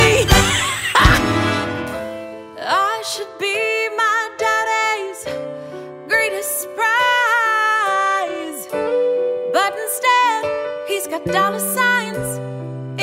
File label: with Vocals